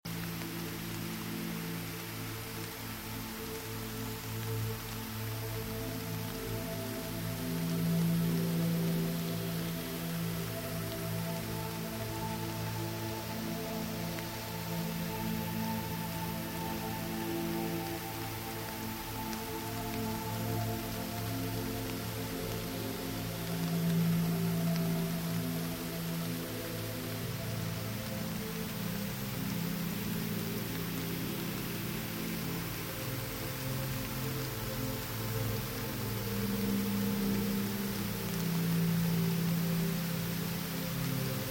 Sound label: Celtic Rain with Magical Forest Hum for Deep Sleep (Loopable)